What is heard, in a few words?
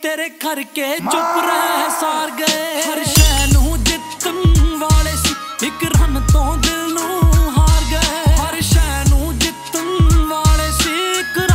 Punjabi Song Ringtone